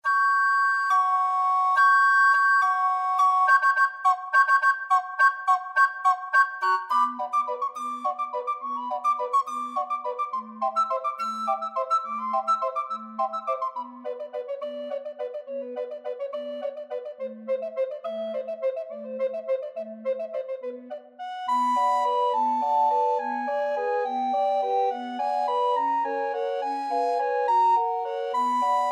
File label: danse macabre, recorder quartet